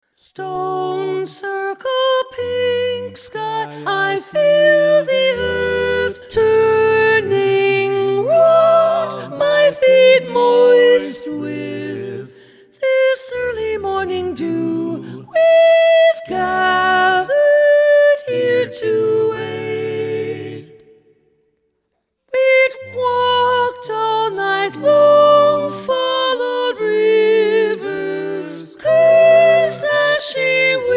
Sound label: Soprano focus, choir; Summer Solstice 2